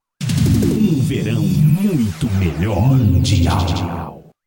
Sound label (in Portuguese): Mundi FM Jingle Verao